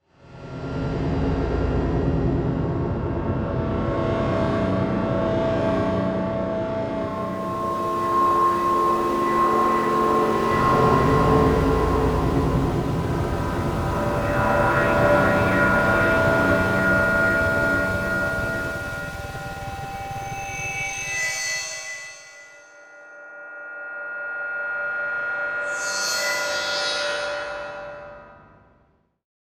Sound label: Electronic Whoosh Reverse Feedback Digital